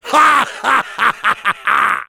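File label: laugh happy, French; Soldier; "[Rire joyeux 3]"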